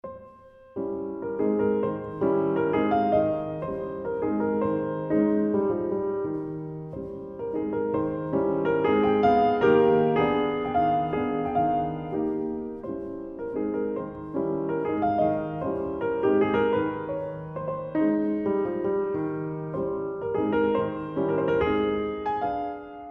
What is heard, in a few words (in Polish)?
Mazurki As dur; F Chopin; 4 Mazurki Op 17 nr 3